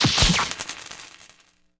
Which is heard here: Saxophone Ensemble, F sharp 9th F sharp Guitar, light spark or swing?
light spark